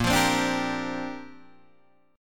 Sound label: Bb 6th Add 9th Bb Guitar Open D